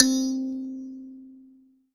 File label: C, Thumb Piano; Chillout; Best Service ProSamples vol.36 - Chillout